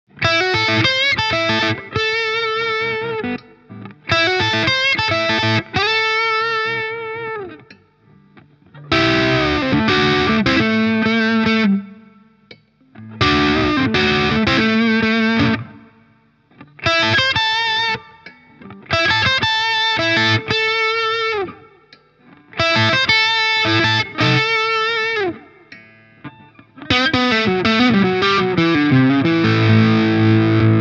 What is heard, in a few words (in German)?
Hals crunch; Yamaha Revstar RSE20